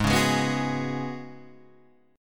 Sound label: Db 7th Suspended 4th Ab, guitar